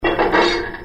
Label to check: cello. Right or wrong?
Wrong